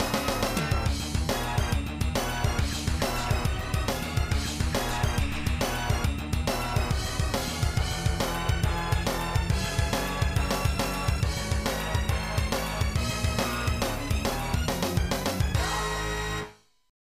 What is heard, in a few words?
Start; Sega Ages Virtua Racing